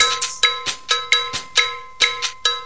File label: afro cuban cowbelly, 090 bpm, drum loop